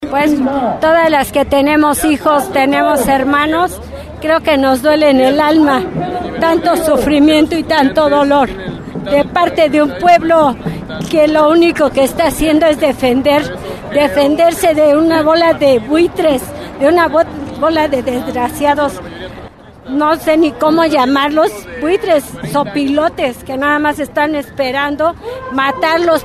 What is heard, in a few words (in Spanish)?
marcha; palestina julio 2014